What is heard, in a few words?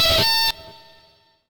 feedback